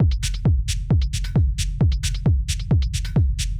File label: Dark Room Techno